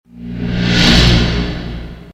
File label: woosh